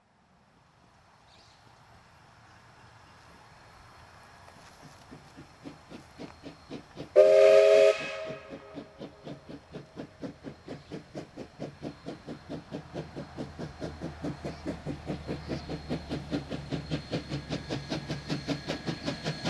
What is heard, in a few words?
at Toomulla crossing loop, Steam Train